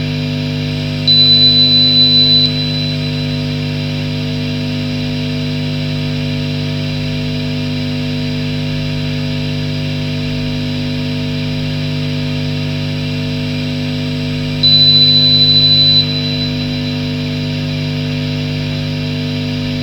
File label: audio beep